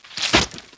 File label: stab; duke nukem total meltdown